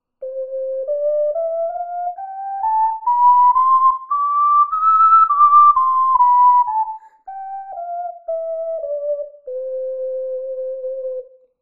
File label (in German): 6 Loch Tonleiter, ocarina